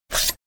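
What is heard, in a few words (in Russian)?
звук протаскивания; Звук ножа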